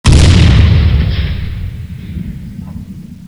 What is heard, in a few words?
blow